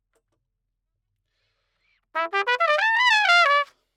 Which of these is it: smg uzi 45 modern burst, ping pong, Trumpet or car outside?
Trumpet